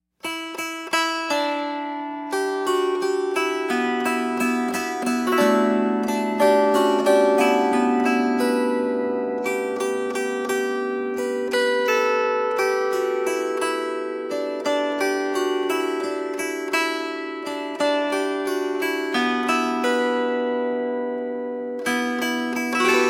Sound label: traditional Breton